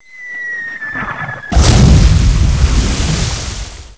sea mortar; overlord

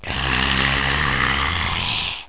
mummy sound; egyptesc

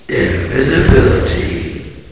invis

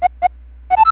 game message medium; zelda

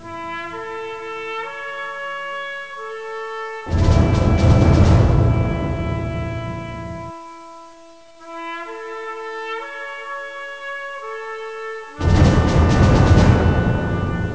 cap music; overlord

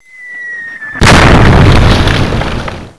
beach mortar; overlord

